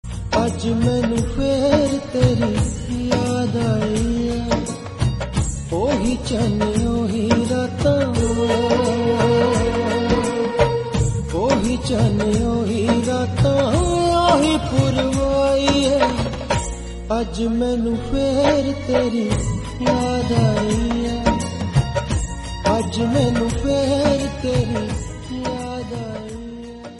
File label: sad punjabi